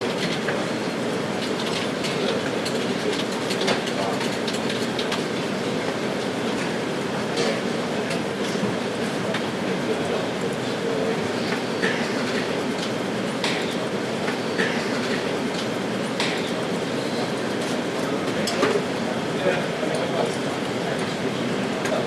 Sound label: office